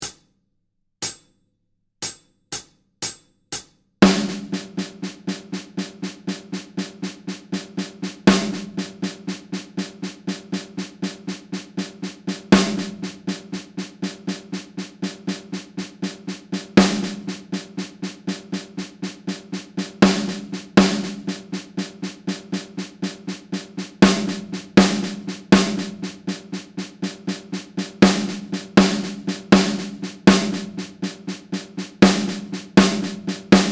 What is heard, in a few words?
Moving Accents 60BPM, snare exercises; Drum Lessons